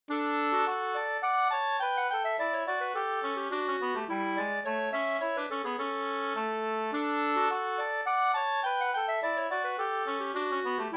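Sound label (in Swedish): polska, tvåstämmig; JAd Hagg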